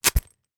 foley wallet purse nylon open velcro; sound effects one

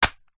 impacts plastic vhs cassette tape

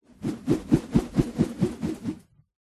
foley rope spin swing fast whoosh

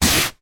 foot slide on carpeted floor

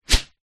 sport tennis racket swing whoosh; zapsplat

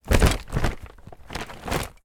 toys in plastic bag light movement

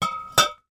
foley 2 bottles of wine knock together

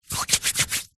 human hands dry rub together; zapsplat

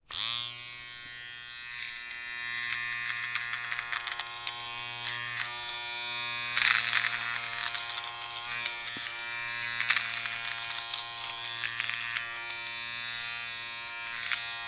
household nasal hair trimmer on operate off

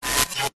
magic wand zap spark power; zapsplat

